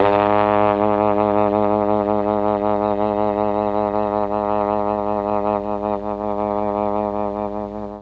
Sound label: G#1 TRMB TRMP, Mellotron; Mike Pinder - Mellotron Archive CD; TROMB+TRMPT